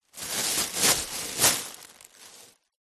plastic packaging sheet short handling movement stuffing; foley